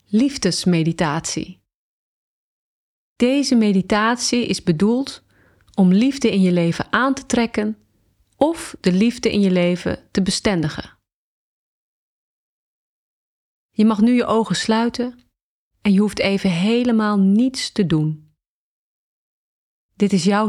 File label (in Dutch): Liefdesmeditatie